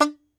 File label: Tenor Banjo, Plucked Strings